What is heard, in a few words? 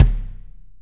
pliskin, Kicks Medium